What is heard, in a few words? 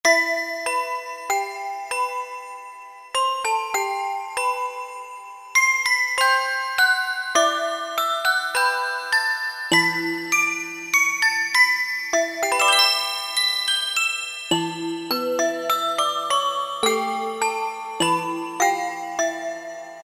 Gamelan Bell, Roland D-50; Factory preset demos